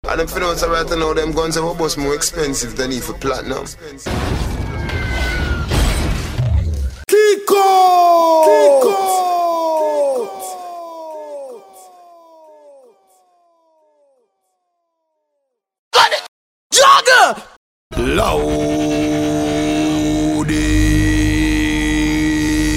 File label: sound effects pack